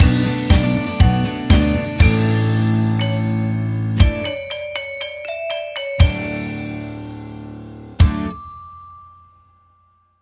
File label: Crafty Changes, Sting Upbeat